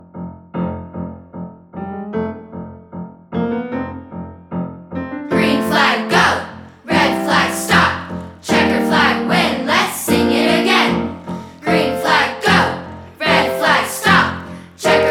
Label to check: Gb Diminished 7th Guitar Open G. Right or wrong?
Wrong